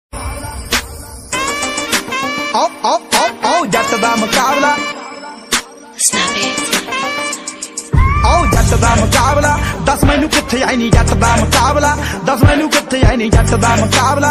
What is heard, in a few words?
new punjabi song ringtone